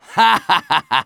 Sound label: Medic laugh evil